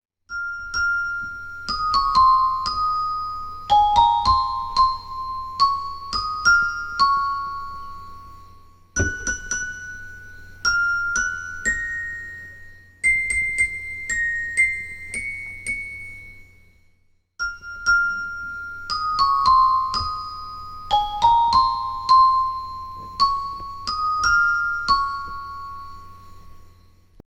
Xylophone

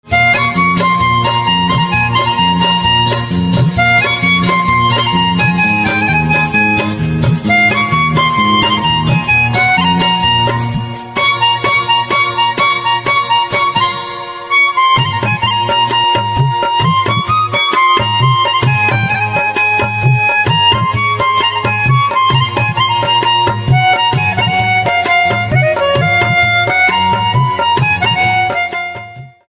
Harmonica, instrumental ringtones

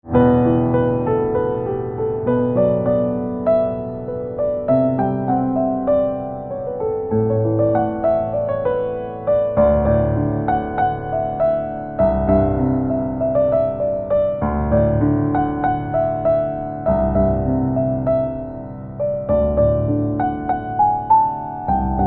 Piano Silent

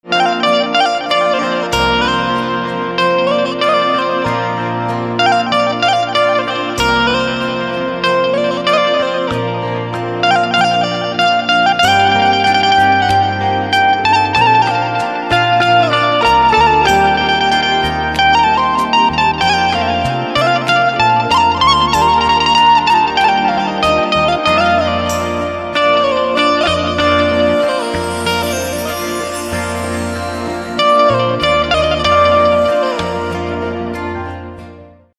Banjo

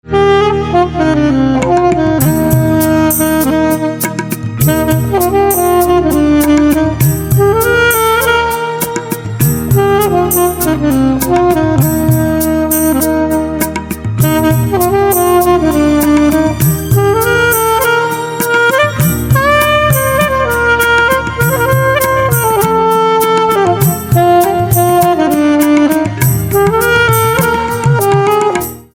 Saxophone

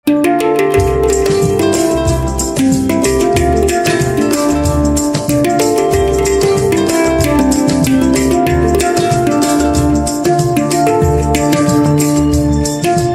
Handpan

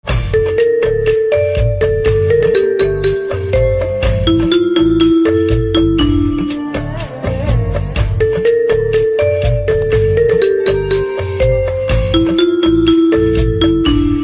Xylophone; fanaa

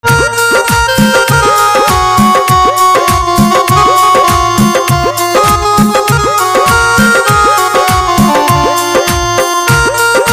Saxophone

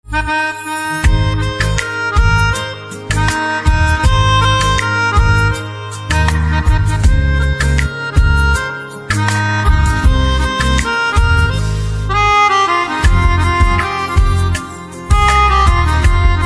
Pianica; instrumental ringtones

